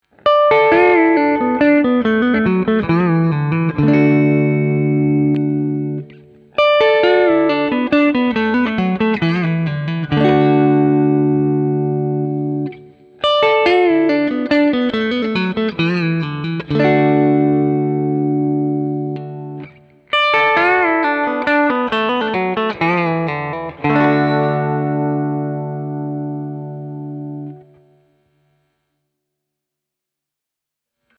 Clean Lick, Neck Bridge Series, Neck, Neck Bridge Parallel, Bridge, vintage guitars